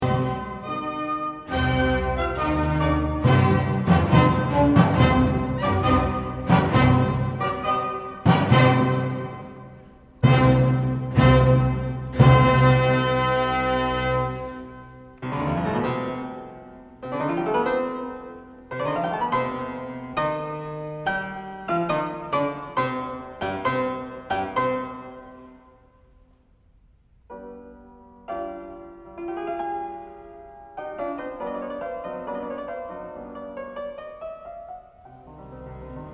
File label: Piano Concerto; Beethoven; No. 3